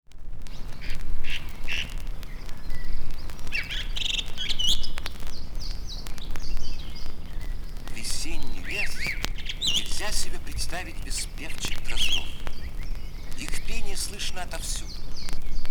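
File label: bird voices in nature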